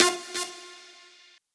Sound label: Stab F